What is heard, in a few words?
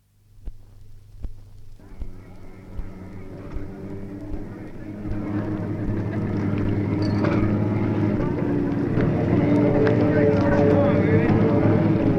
Sound of hydroplane racing across water (from 78 disc); Boat; Boats; BBC